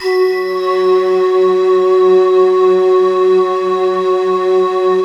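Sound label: F#3, GLOCKENSPIEL FLUTE; Best Service; Advanced Media Trax Modern Composer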